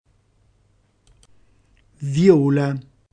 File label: viola